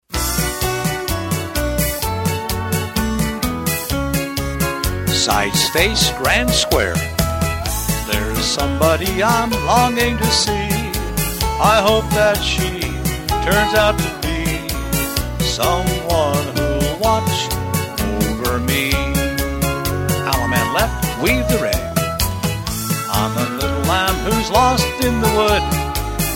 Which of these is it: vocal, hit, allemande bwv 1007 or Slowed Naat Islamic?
vocal